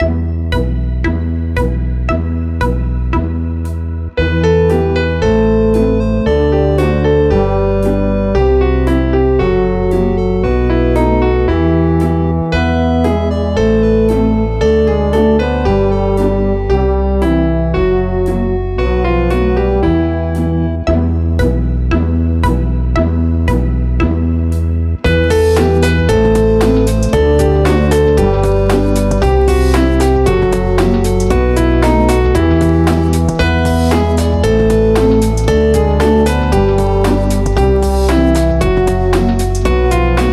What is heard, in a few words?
karaoke